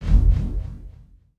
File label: Pickup Magic; SFX Kit; Pickups